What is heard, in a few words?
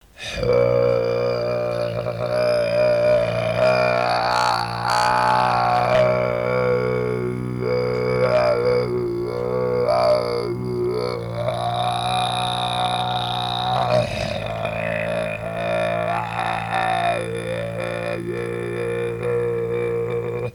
kargyraa, throat singing